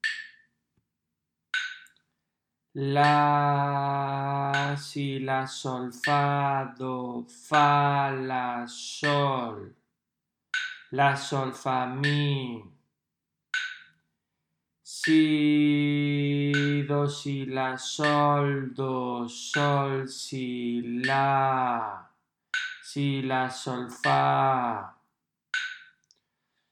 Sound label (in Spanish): RITMO